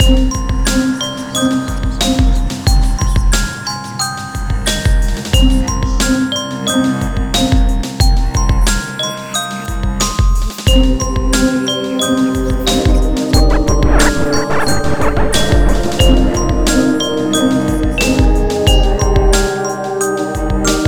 Glock Rock; Death Jr. and the Science Fair of Doom; Nintendo DS

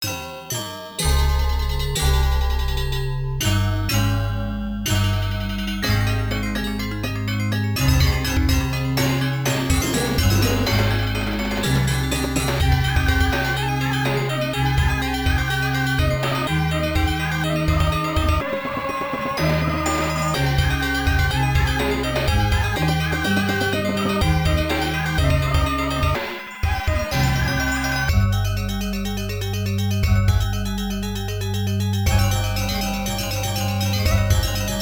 Match BGM; Tao Taido arcade

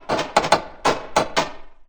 Atmo Random Creak

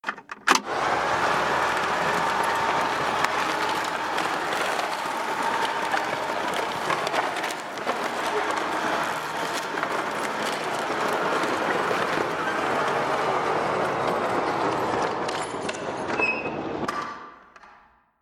Machine; Five Nights at Candy's

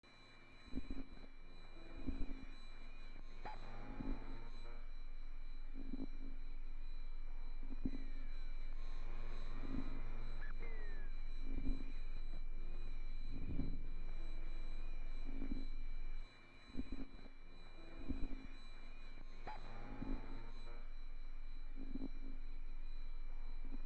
Minigame Ambience

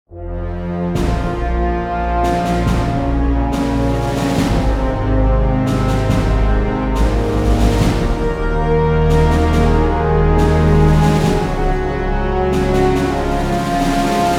Battle Start

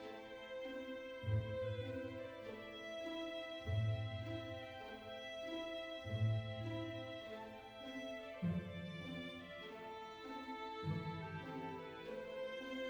Adventure Soft Loop, soundtrack; atmasphere windows gamerip 2018

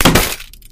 Physics Plastic Box Break; Counter-Strike: Global Offensive

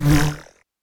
Enemy Boss Flying Move; Smilinguido

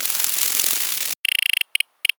Warrior Fly In; We Are the Dwarves

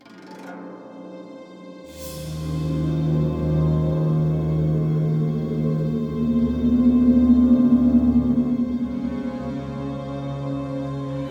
String; The Bureau XCOM Declassified

